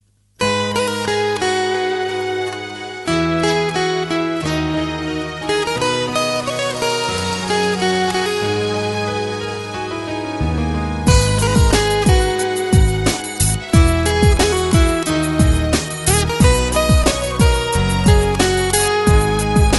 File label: Romantic